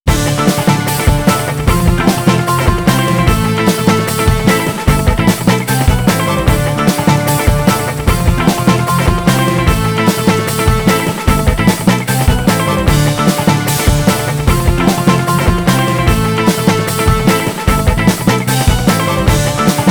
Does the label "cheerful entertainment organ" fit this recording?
Yes